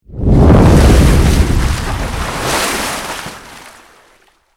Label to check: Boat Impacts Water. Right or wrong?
Right